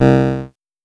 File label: Casio VL1 Guitar; Giga Samples Collection